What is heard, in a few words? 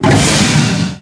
burst; WhiteOut